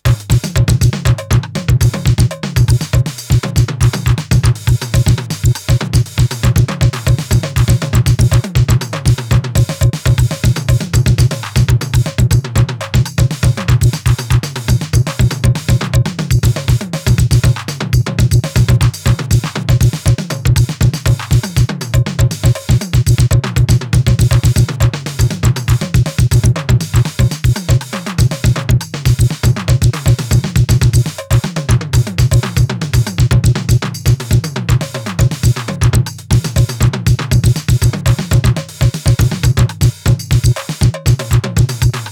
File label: tr707 filter; ic-diffusion